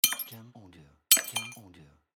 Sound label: Glass Break